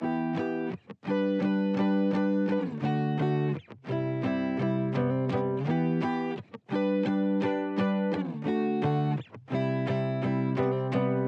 170 BPM G Maj Chords, Guitar; Loop Stems; Cymatics; Miami Guitar Pack - Pt 1; Best Days

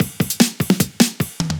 Dubstep Fill, 150bpm; Ghosthack; Dubstep Sound Arsenal

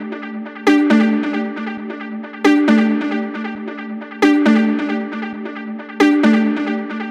Synth Loop G 135, Techno; Ghosthack; AC2024 - Day 1 - Techno Sounds 2025

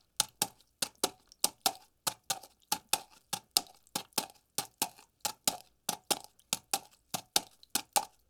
Horse hooves slightly wet

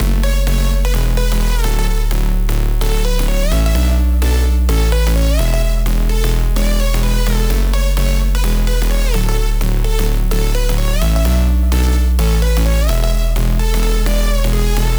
128 BPM F# Min, House Drop Loops; Glider; Cymatics

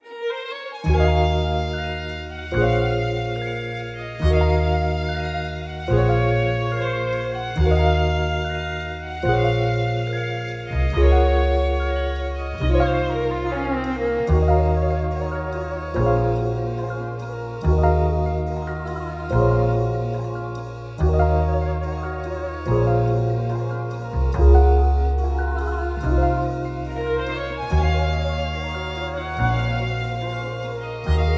143 BPM A# Min, Melody Loops; Americano; Hip Hop

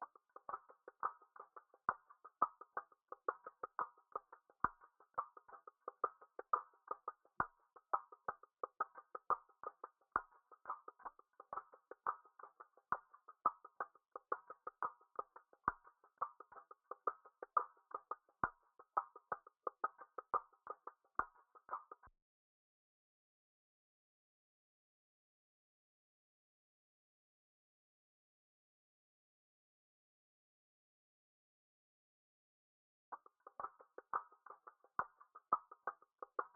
87 BPM D Min Percussion, Afrobeats; Melody; Loop Stems; Waterfall; Cymatics

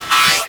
Collectibles Sci Fi FX; Video Game; Cymatics; Video Game Sample Pack Vol 1

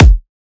Kick, Drums

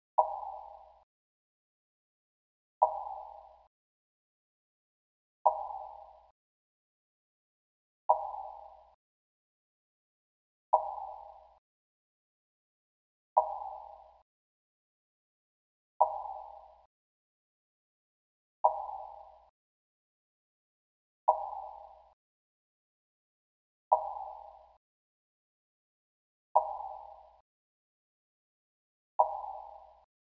91 BPM G Min Percussive Hit; Loop Stems; Cymatics; Gravity

